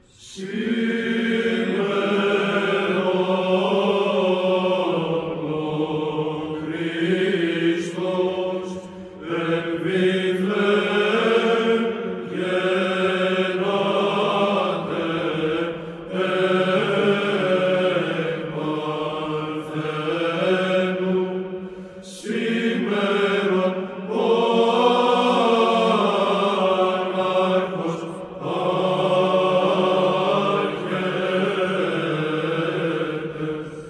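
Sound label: Simeron O Christos, Choir; ChoirXalk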